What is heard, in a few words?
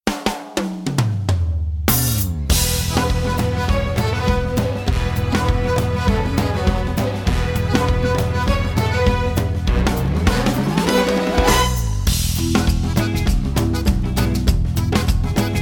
Accompaniment; Young Simba